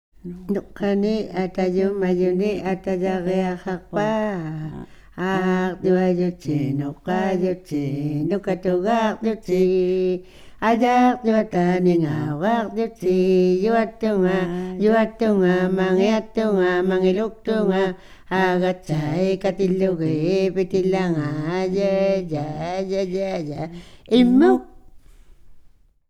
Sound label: Hide-and-Seek Song, INUIT